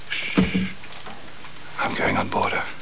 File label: shhhh